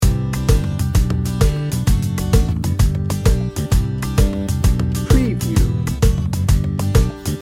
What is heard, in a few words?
irish jig loop